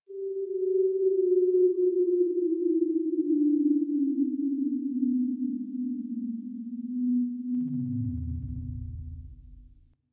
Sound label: Venus Landscape Distant Corpus Texture, Ambience; Warframe; Venus Open World